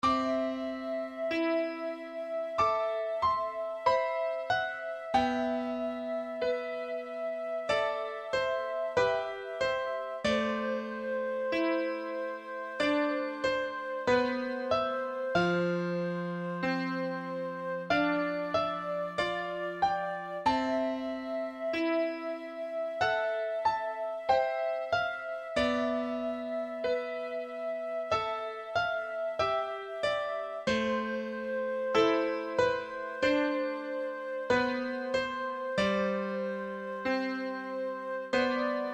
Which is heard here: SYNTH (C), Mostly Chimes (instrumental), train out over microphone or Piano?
Piano